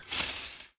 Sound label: Glass Shatter